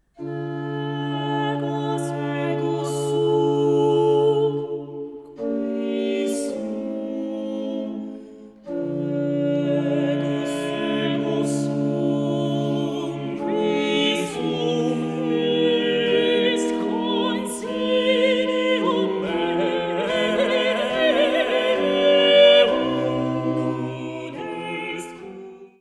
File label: Ego sum qui sum a 4 canto alto tenore e basso